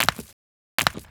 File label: Resynth Stone Break